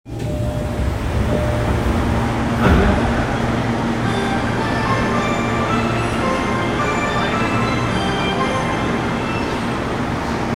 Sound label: train sounds; 東西線神楽坂西